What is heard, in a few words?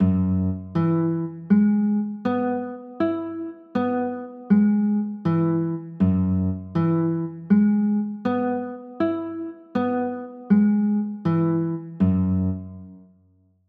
f sharp 9; f sharp 11